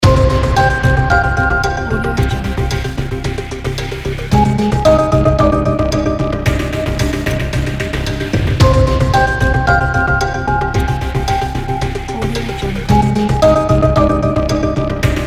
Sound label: the countdown